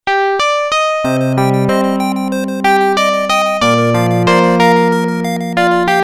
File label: PC-8801; Imitation